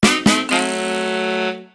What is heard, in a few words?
Jingle Drumroll Sad